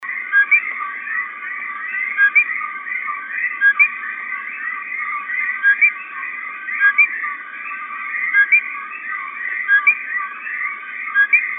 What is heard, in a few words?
E portoricensis, coqui